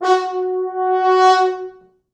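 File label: 4 French Horns; East West; Quantum Leap Horns Sax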